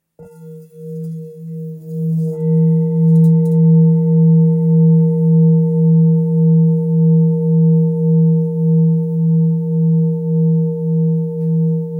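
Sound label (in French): Bol super lune belier mi, bols chantants tibétains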